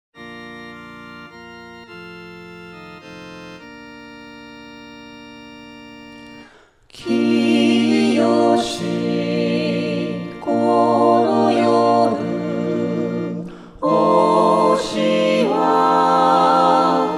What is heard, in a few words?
chorus; kiyoshikonoyoru